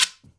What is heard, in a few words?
GUIRO, Percussion; InVision Interactive; Percussion Slam